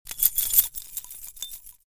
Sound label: Bunch of keys handling and jingling